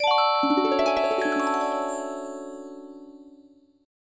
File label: Breeze mail, notifications; Fujitsu F-42A